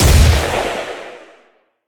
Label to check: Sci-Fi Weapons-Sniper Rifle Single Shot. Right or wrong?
Right